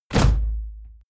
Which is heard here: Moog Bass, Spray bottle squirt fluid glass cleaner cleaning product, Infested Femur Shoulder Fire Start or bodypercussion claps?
bodypercussion claps